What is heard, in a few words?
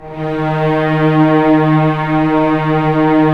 orchestral strings f slow; Roland LCDP13